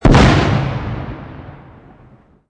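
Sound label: explosion medium; modern warfare 2; amb elements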